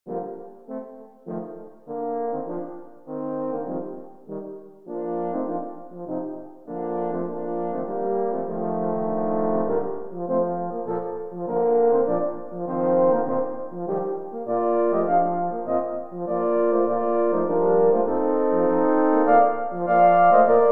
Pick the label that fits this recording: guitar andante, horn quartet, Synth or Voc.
horn quartet